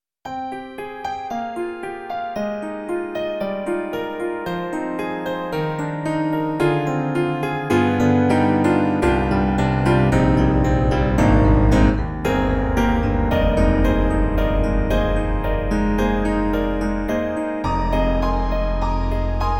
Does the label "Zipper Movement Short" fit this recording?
No